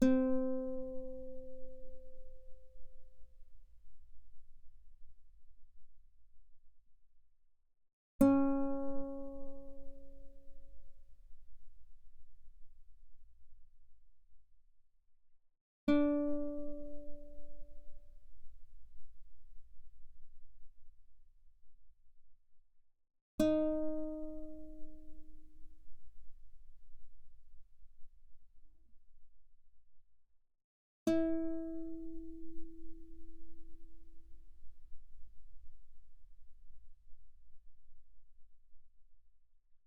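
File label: Guitar mezzo forte sul B C4 B4; mono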